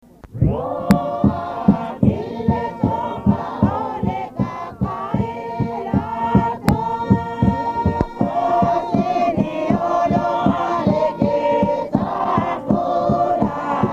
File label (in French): choeurs, wallis futuna; sigave